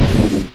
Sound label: Explosion Enemy